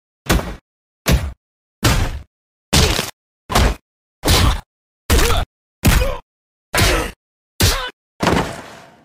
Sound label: Kick